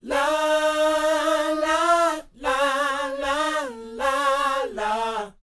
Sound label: NA-NA G#; Spectrasonics Vocal Planet